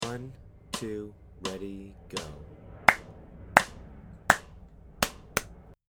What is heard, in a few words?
rhythm practice